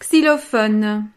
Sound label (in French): xylophone